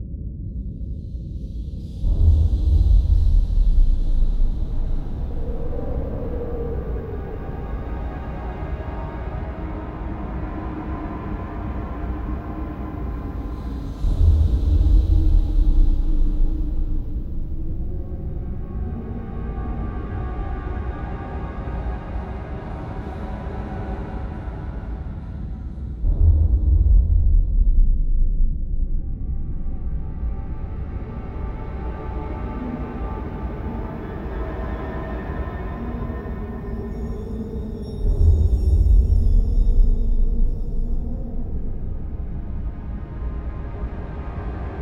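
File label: ambience temple; Blackfaun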